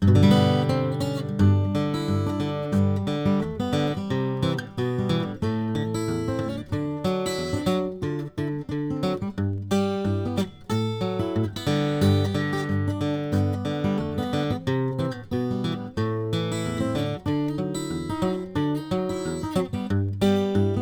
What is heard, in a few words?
Model J Mahogany, guitars; James E Wood